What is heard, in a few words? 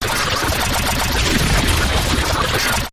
Big Chaos